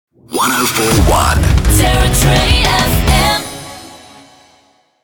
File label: Jingle Sting 140bpm; Territory FM